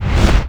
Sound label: Camera Woosh; Warframe; Grineer; Thumper Bot; Movement; Spin